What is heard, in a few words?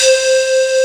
PANPIPE C4; AKAI S6000 CD-ROM - Volume 1; BrassWind